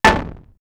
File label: Klonk, synth fx; Concussion; X-Static Goldmine